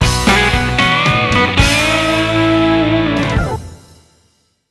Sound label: Jingle Catch; Let's Fish Hooked On; PS Vita